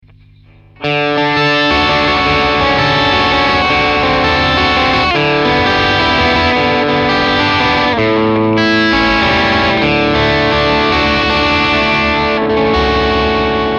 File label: Strat Am Pro Overdrive puente